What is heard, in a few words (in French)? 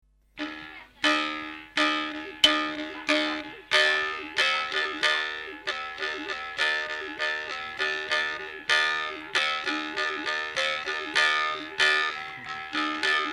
Solo de fourche vibrante dao, Vietnam